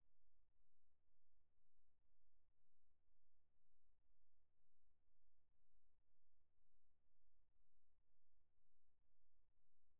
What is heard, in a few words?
silence